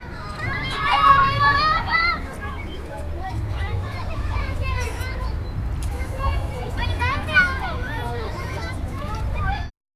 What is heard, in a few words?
daytime a park in the, park